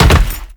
land heavy metal, footsteps; Enemies; Warframe; Teshin

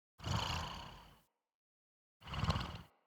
Wolf Cub Sleep Idle Breathing; Vocals; Orengal Wolf Cub; Animals

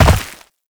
land dirt; Movement; Warframe; Uriel

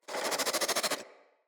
Infested Crawler Attack, Enemies; Crawlers

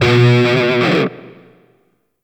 Shawzin Flourish Oct One B; Warframe; Grineer Shawzin

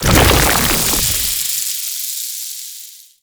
Horrors Arm Collapse; Warframe; ManInTheWall; Octopede

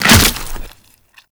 Headshot; Warframe

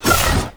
Whoosh, Glaive; Glaive Ghost Catcher; Warframe; Weapons

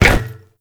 mount balloon land; Drifter; Warframe; The New War

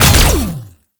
Sentient Conduit 3D Fire, Weapons; Warframe

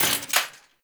Arch Rocket Crossbow 2D Mech; Warframe; Archwing

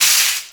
Shopping Cart Hit; Warframe; Ambience; Nineteen Ninety Nine

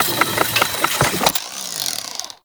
Drone Spawner Close; Warframe; Entrati

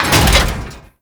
Gatling Gun Dry Fire; Warframe; Tau Gatling Gun

